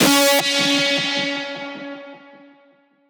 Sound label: synth screech oneshot D#; Synth Hits; GhostHack